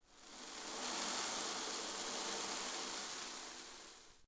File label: desert sand; Ambient; Civ4 warlords